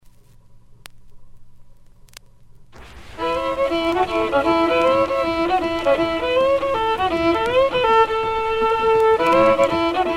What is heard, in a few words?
Cajun Waltz